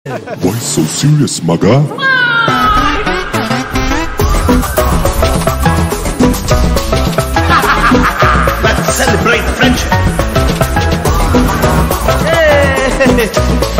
party mode ringtone, bgm; nivin pauly

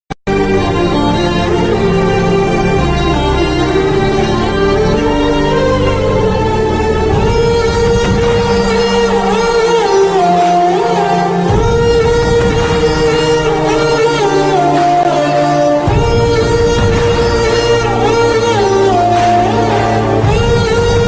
emotional ringtone; dilwala movie; ram pothineni; anupama

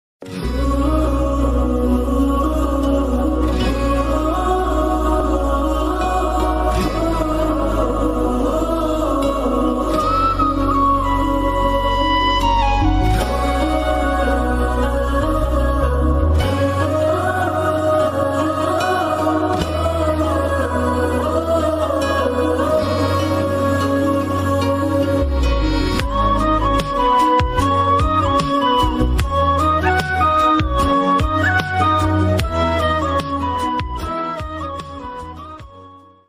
bgm music instrumental music ringtone; fidaa film

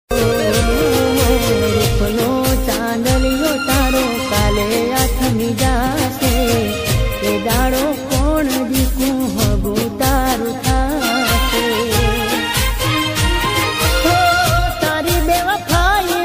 song ringtone

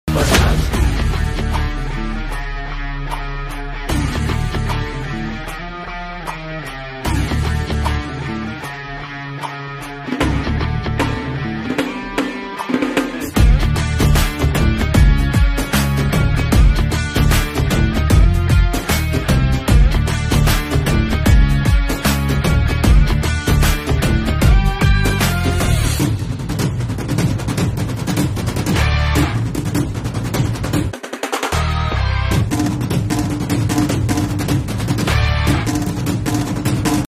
song bgm; pushpa 2

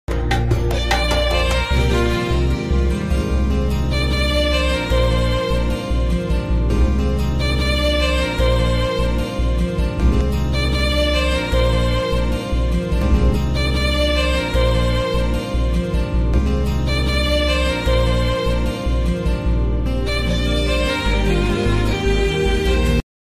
romantic ringtone Hindi ringtone

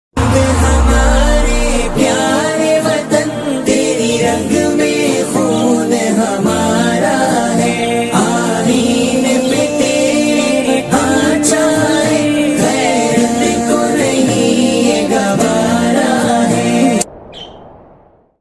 desh bhakti ringtone; 15 august

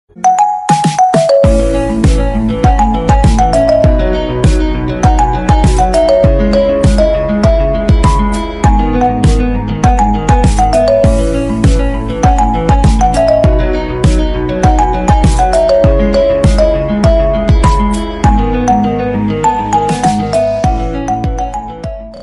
instrumental bgm ringtone attitude bgm

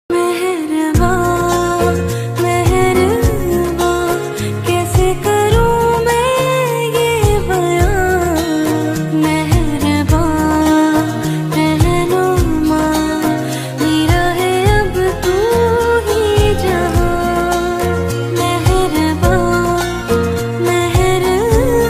female version ringtone; salman

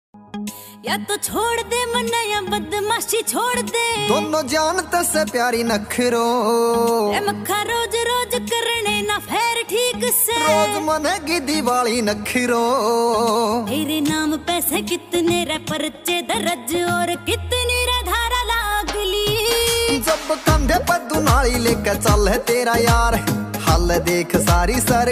ringtone haryanvi ringtone

